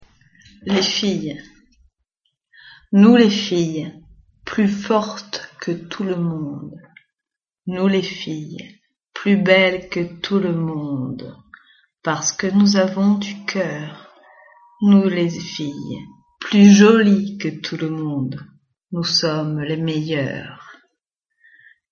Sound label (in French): chanson les filles